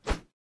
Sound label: swish; Smite; god Kukulkan v5